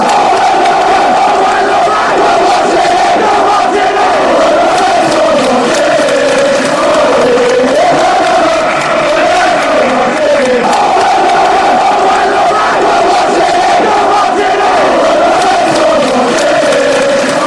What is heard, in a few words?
oh when the reds go marching in, chants